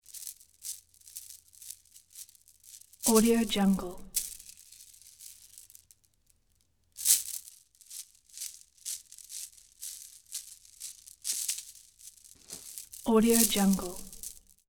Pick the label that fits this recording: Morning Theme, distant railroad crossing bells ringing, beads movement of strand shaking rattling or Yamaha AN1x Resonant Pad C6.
beads movement of strand shaking rattling